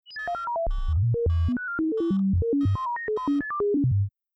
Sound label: robot scan slower; time-lab